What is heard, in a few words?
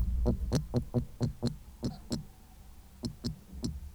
Prionotus sp, soniferous fishes; Carolina fishes